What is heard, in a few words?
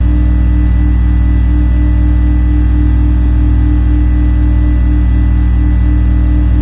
machinery; XDM; ambience